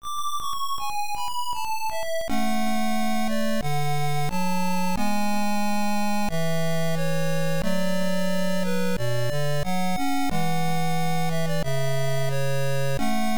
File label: Again (Game Over); Aleste; MSX2; PSG